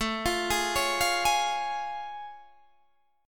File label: A Augmented Major 7th A Guitar DADGAD